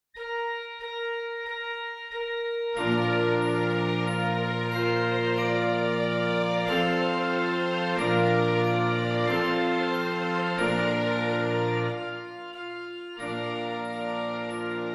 Horns in Fifths; Good Pierrot

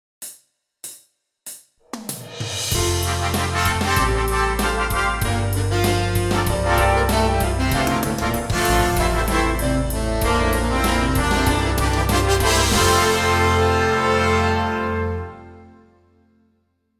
Basic Daily Warm up C

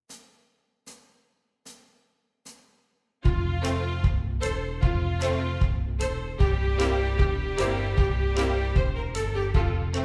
76bpm

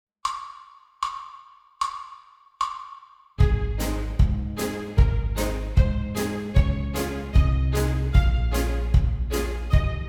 Concert Ab Scale with Thirds

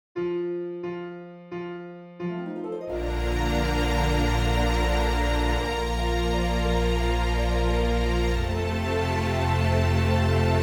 Advanced Daily Warm up C

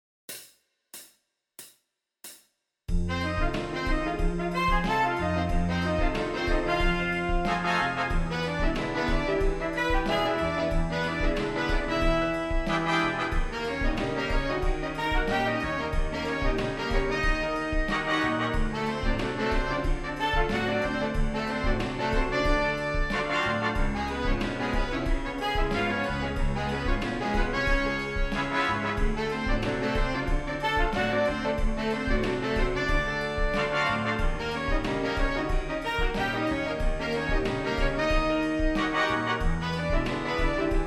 Lip Slur 3 Horns in Fifths

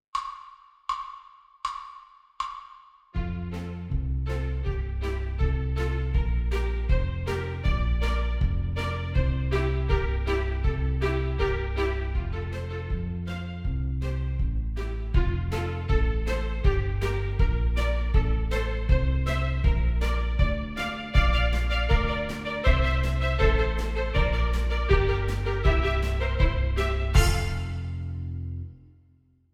Fun with Thirds Horns in Fifths

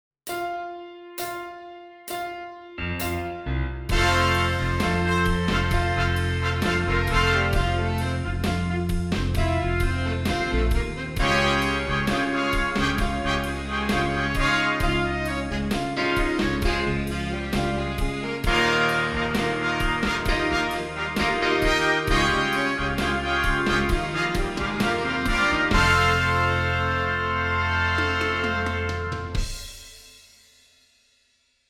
Exercise

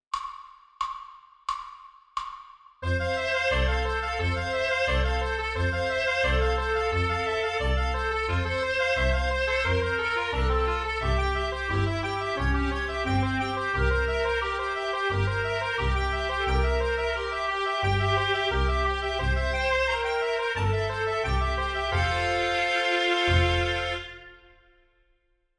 Spinning Top Horns in Fifths; Dreidel

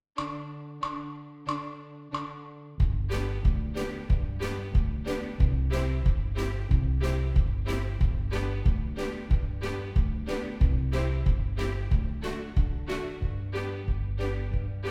Finger Roll

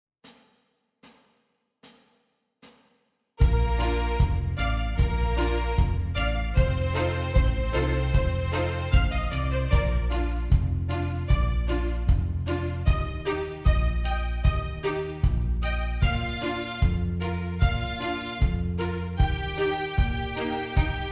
76bpm

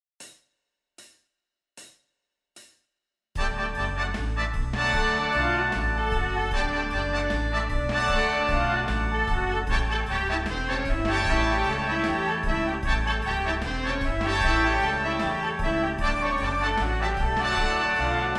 Horns in Fifths

